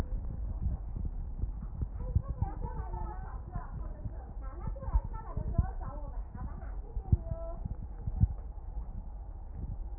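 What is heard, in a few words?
anterior chest, position 4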